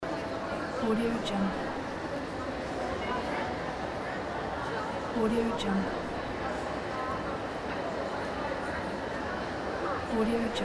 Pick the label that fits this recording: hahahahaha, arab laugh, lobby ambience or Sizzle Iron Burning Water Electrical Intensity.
lobby ambience